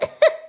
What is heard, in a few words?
coughing; daisy; sm64coopdx; char-select-extra-chars; [CS] Extra Characters